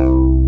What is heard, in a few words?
BASS